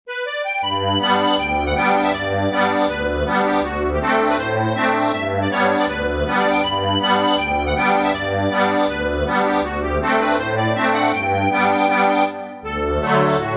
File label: Polka accordion